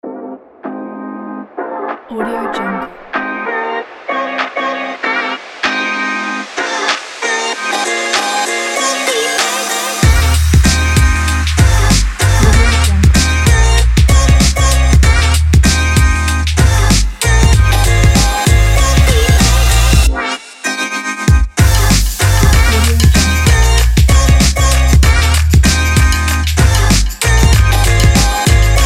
hiphop beat

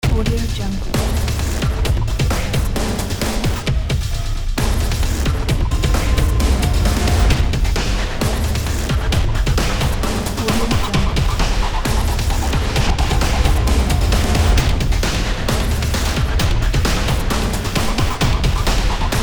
industrial action; musickidsound

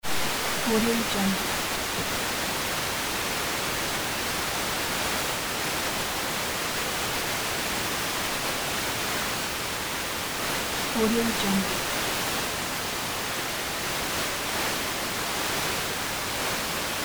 tuning fm radio

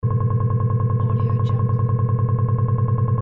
generator working loop

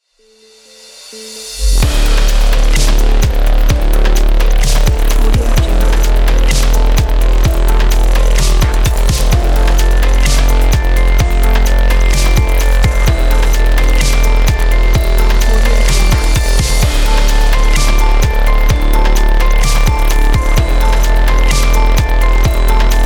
dubstep technology

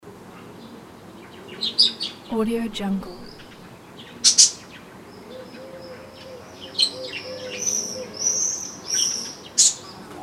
birds atmosphere; soundfoodsfx

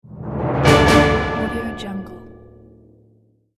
dramatic orchestral breaking news jingle

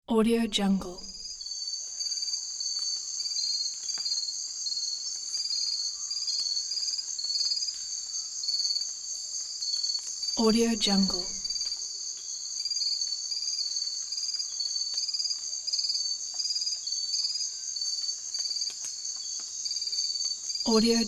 night ambience

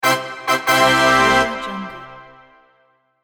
presentation fanfare

